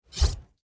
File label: Knife Pull; soundscrate